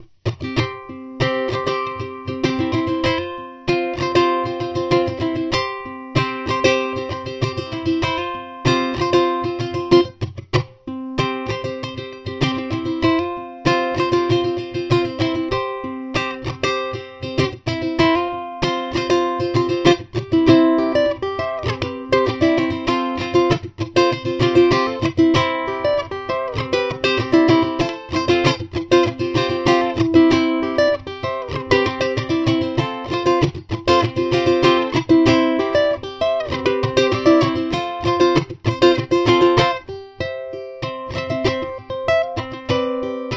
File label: Tenor Variations in C